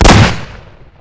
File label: big gun, ambience; gearbox